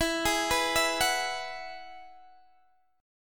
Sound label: E Major Add 9th E, Guitar, DADGAD